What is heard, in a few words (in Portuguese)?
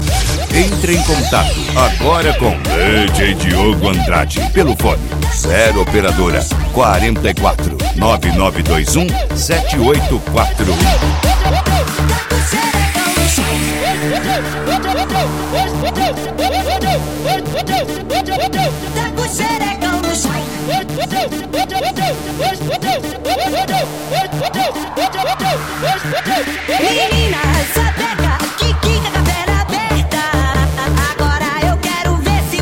eletro funk